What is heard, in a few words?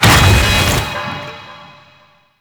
Pirate Undertow Impact; Warframe